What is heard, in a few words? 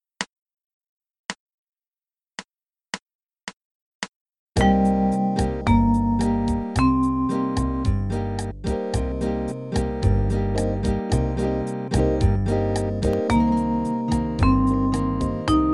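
Min 7 Second Inv, arpeggio minor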